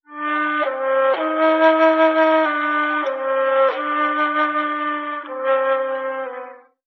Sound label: flute, Loops, Sad